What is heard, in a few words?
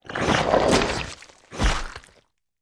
mutant fish death, monster